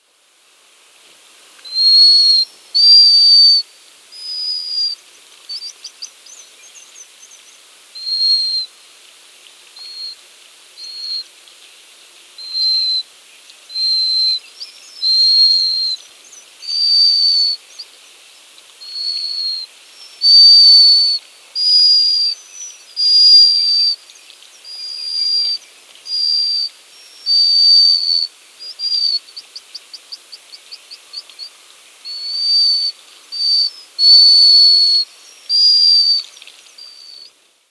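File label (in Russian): chistik golos, black guillemot voice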